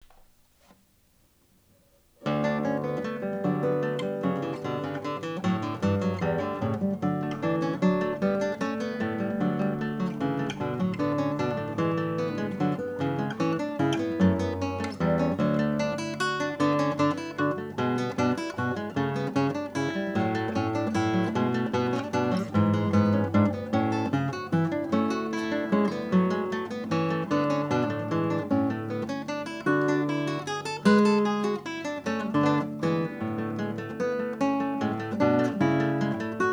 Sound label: allegro